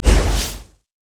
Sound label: steam golem static field hit; LoL; blitzcrank